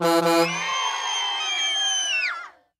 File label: Bus Horn